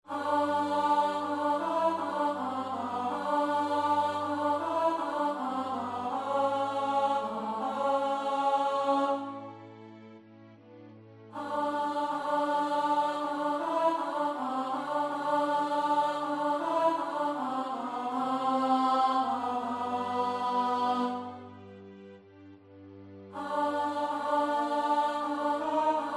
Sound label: Alto